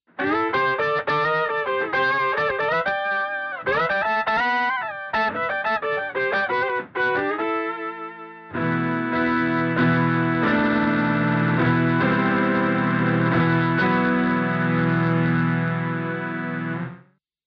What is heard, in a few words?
LEAD